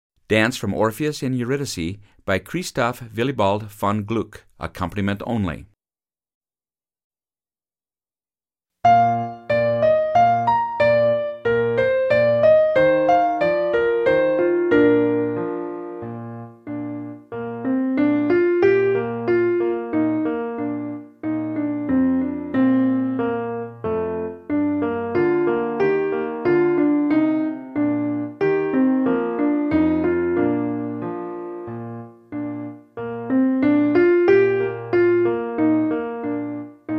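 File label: Accompaniment only; Piano Only – Performance Tempo; Dance from Orpheus and Eurydice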